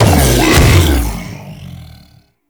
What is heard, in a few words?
Bowl Start A; Warframe; Grendel